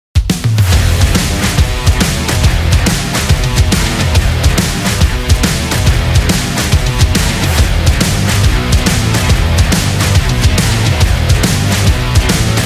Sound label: Rock Intro; electro rock